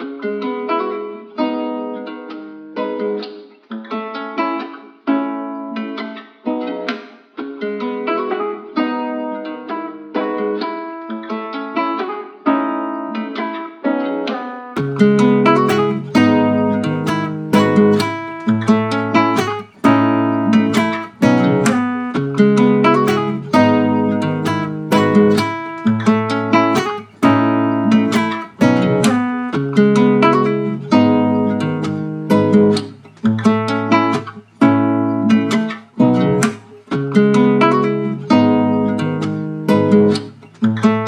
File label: Journey Guitar Loops, 130 BPM C# Min; Apology; Cymatics